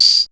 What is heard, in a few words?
78 TAMB L